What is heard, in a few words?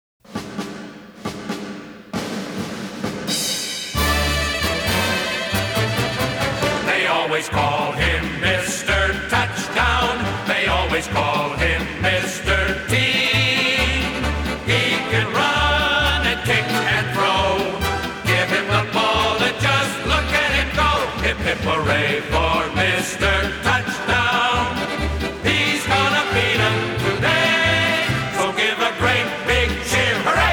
song